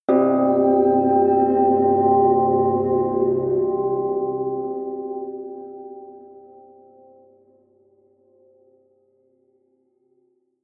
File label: melodic odd sweet bell; flaechen